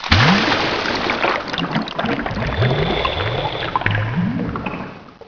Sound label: Jump into it; SoundDiver 2.0.10